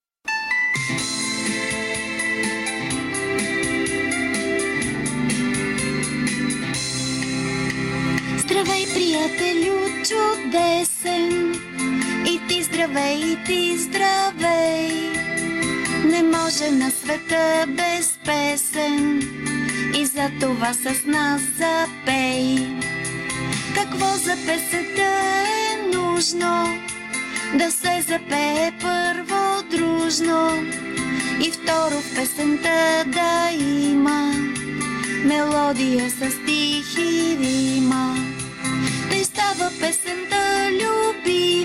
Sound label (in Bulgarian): liubitelsko karaoke